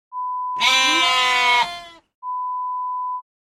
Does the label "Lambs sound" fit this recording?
Yes